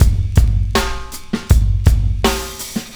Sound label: Roland SR-JV80-12 Ringn Kit 81bpm